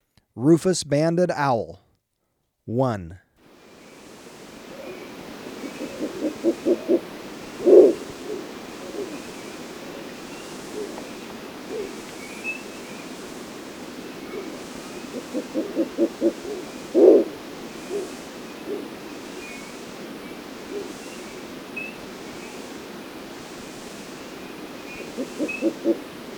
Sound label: Rufous-Banded Owl, Birds of Ecuador; S. America